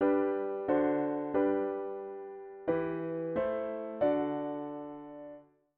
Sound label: chord prog chunks diat inv and V7